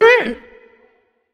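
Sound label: ouch, female; smite; voicepack; hera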